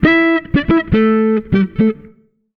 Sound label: FK SOLO, Jazzistic; FNK